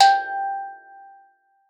steeldrum G4; Xsample VOL-4

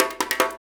PRC HAND, Africa, Perc Loops; Roland LCDP14 Africa VOL-2

